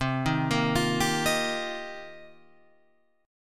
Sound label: C-Minor 11th-C-12,10,10,12,10,10, down, Guitar, Open F, Cm11 chord; arpeggiated; JGuitar